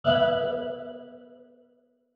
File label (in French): Guitare echo